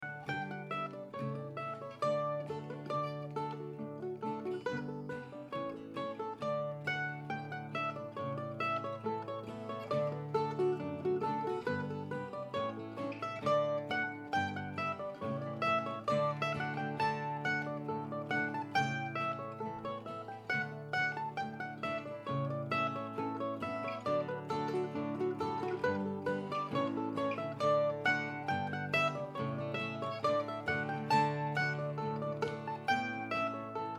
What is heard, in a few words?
Hornpipe